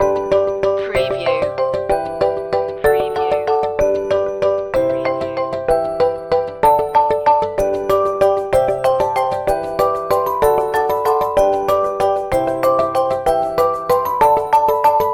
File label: light signals loop